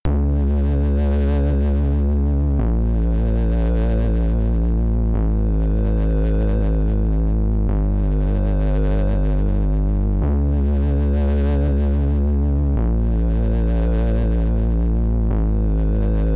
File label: BGM A, SID Stereo; brain artifice; commodore 64